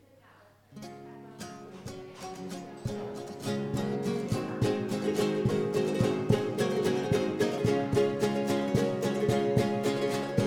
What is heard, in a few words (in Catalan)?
Jota curta